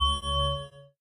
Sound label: nut spawn; Rollmorad